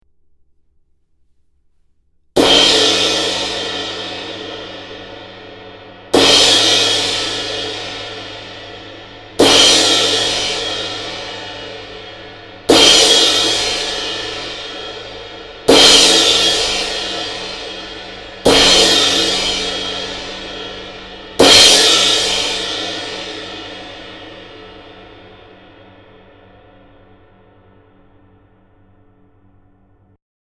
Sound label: 20 crsh